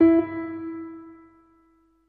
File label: wood spinner